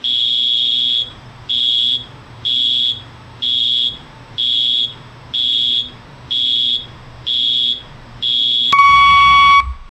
sonido de alarma de frena; warning

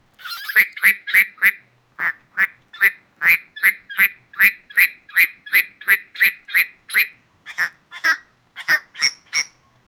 patito tierno riendo llam, domestic animals

